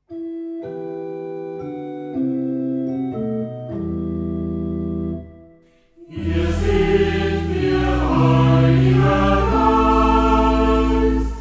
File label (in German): Hier sind wir - Ruf Chor und Hausorgel